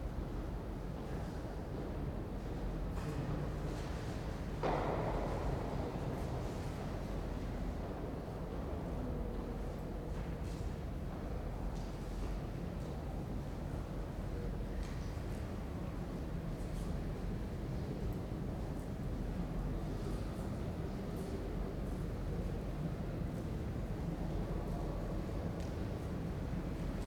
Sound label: HALL EVE